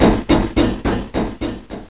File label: pit drone run on grate; svencoop